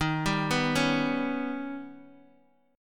Eb Augmented 7th, Bass Standard; Eb+7 arpeggiated; 23,22,21,18; JGuitar

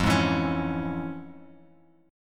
F Minor Major 7th Sharp 5th, F, Bass Standard; strummed; JGuitar

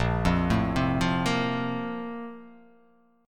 Bb Major 7th Suspended 2nd Bb down; BbM7sus2 arpeggiated; JGuitar

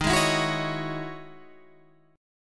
E Major 13th chord

